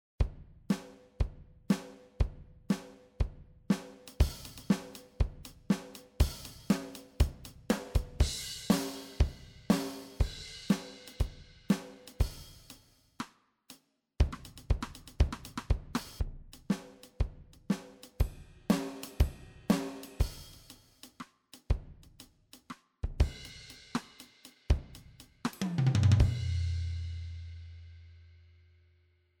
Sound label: MOOER Drummer Country Pattern Stereo